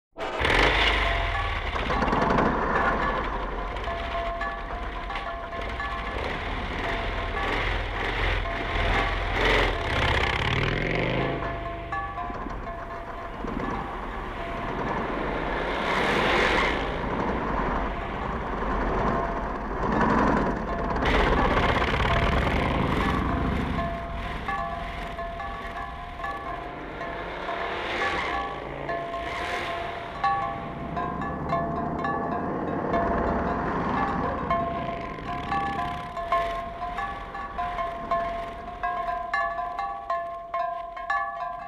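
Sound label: motorcycle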